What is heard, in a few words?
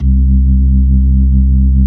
B-3; Roland LCDP10 Keys of the 60s and 70s 2; Club Ch. Xfde